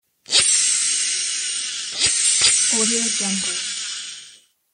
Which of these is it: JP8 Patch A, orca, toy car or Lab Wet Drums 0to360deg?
toy car